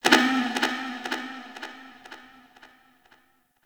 Ship Energy Bounce; Warframe; Corpus Ship Remaster

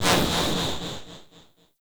Electro Mag Whizz By A, Corpus weapons; Warframe

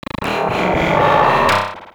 Talking Machines; Ambience

